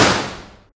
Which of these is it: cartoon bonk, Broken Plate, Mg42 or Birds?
Mg42